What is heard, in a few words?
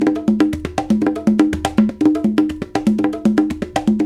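Busy Drum Whistle, African Contemporary; Spectrasonics; Supreme Beats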